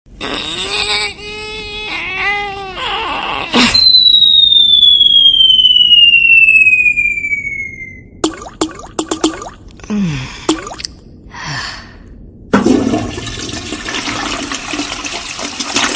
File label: funny toilet ringtone